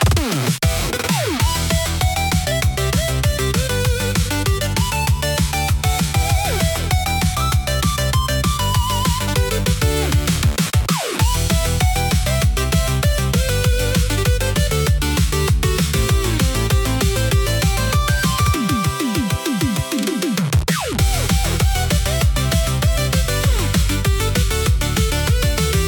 Pixel Party Power-Up, retro game chip tune; pico pico sound

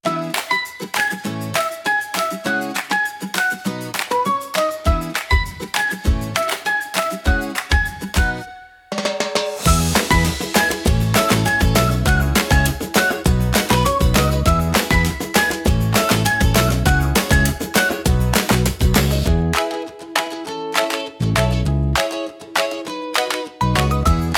whistles, for cheerful active; morning

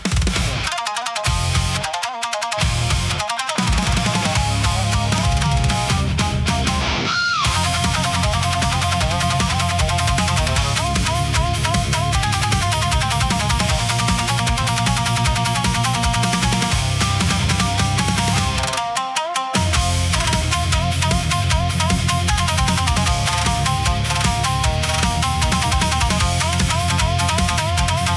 Blade of Broken Thunder, intense Japanese rock

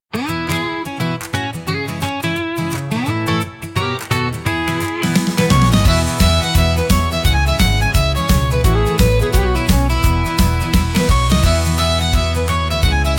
Keg Song Reel, irish pub rock

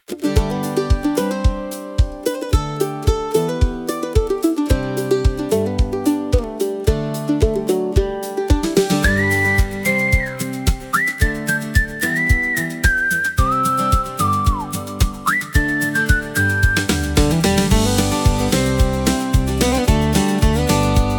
Sun On The Highway, country acoustic

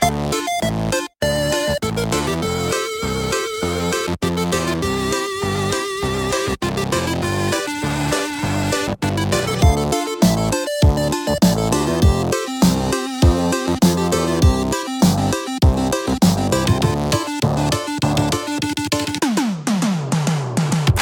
retro game chip tune; pico pico sound

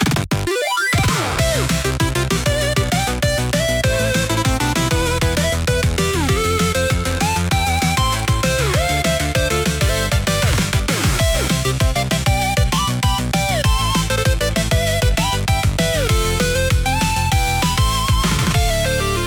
retro game chip tune; pico pico sound

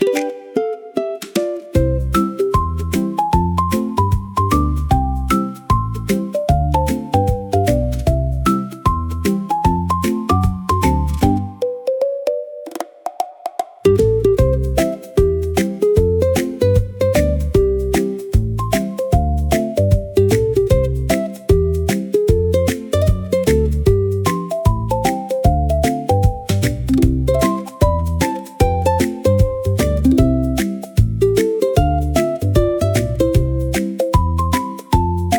ukulele tropical for bright and light

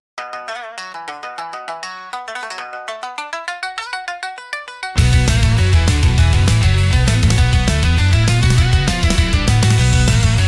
Shinobi on Fire, intense japanese rock

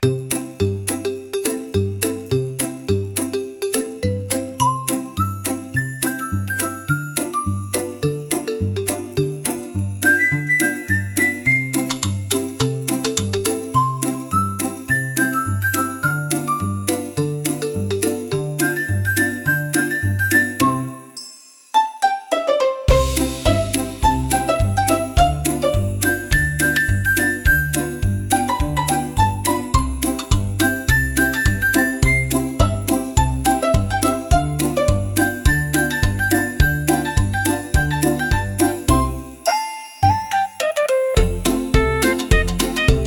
comical marimba for funny scenes

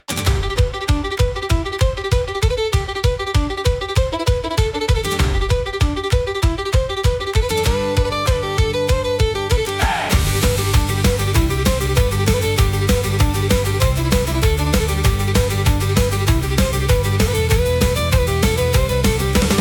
irish pub rock

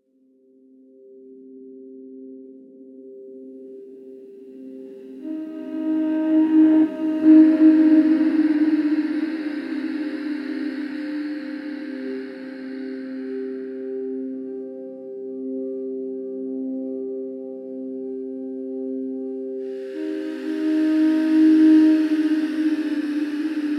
Phantom Glass Room, spiritual spooky soundscapes

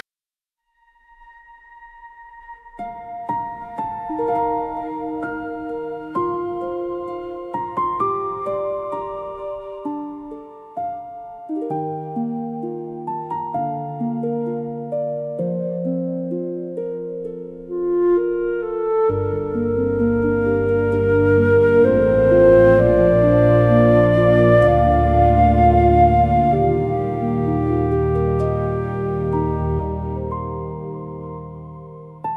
fantasy music nature forest

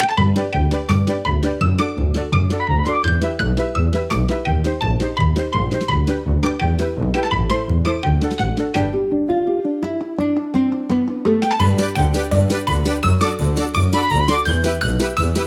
comical marimba for funny scenes

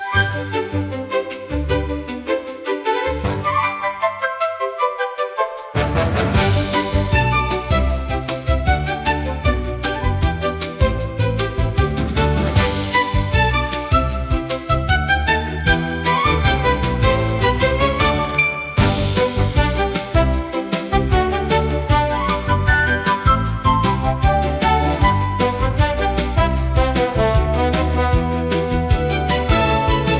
fantasy music cute bright; disney style; fun fantasy world

